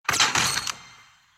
Fortnite Headshot

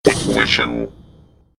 Ignition Sound

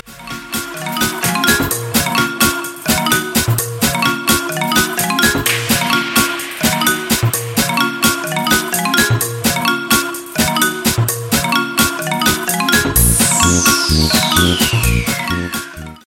Scooter

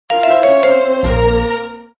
notification

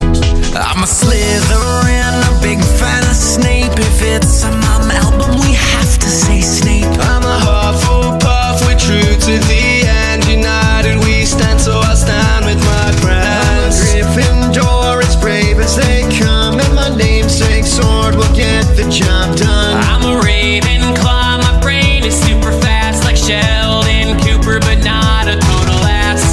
House

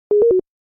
A Simple Bloop

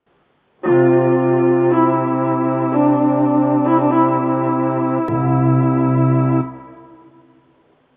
String Quartet

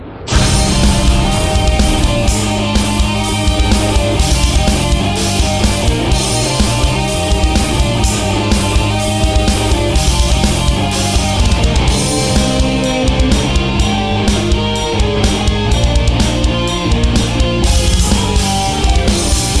Metal; Guile

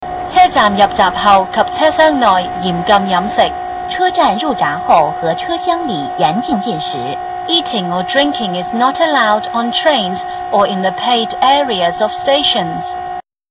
Hongkong Mtr

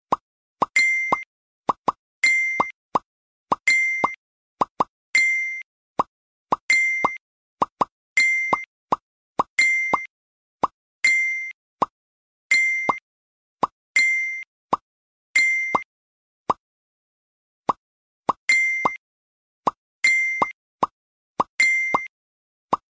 FB MESSAGE REMIX

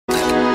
Camera Sound

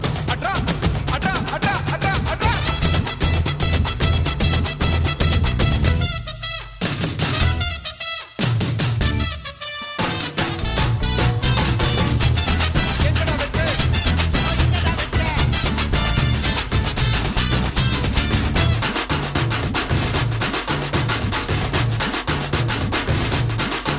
Kuthu Music

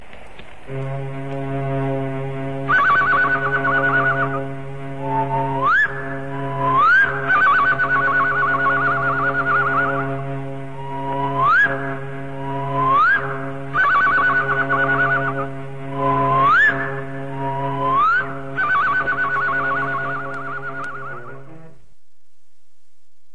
Scary

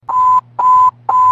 LAPD priority call

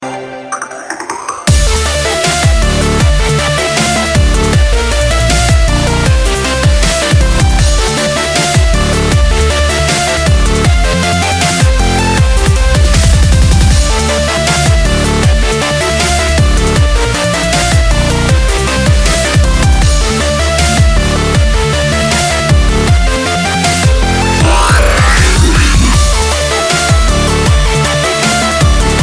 Hardrock

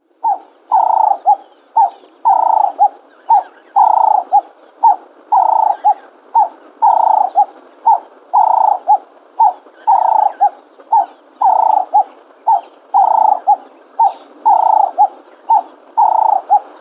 Cape Turtle Dove

